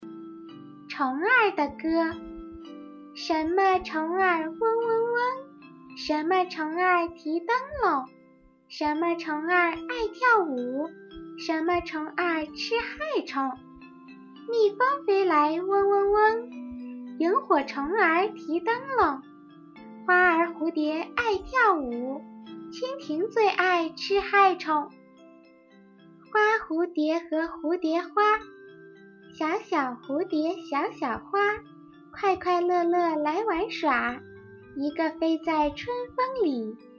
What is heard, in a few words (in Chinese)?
童声 儿歌 选段